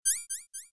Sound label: item open